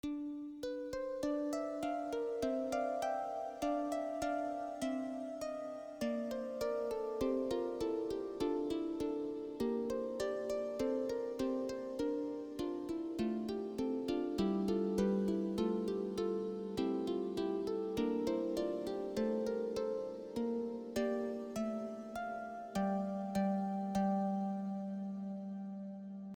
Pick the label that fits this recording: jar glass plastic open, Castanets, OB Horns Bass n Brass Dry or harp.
harp